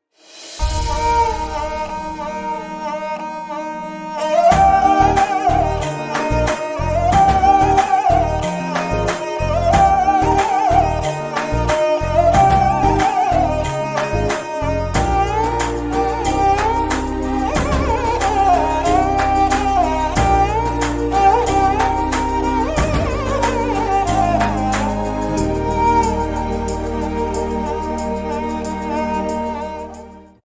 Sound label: love bgm ringtone, south indian bgm ringtone; comali movie; school love